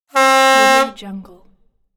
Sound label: truck horn; moonaudioproduction